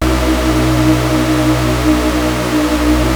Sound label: Prophet 5 B2; Hollywood Edge - Giorgio Moroder Rare Synthesizer Collection